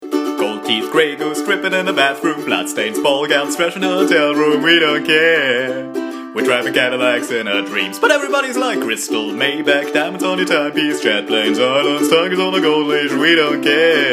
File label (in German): Sprechgesang; LOFT VOICES